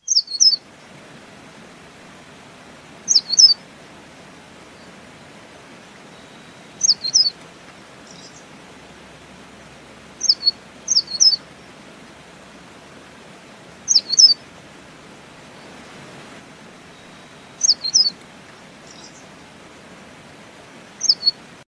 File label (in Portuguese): canto do mineirinho